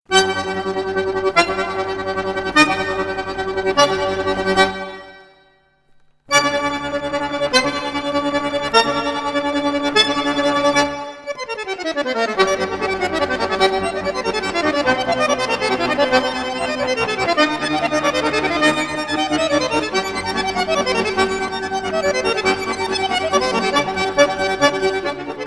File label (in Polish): duet akordeonowy 432 hz; vivaldi summer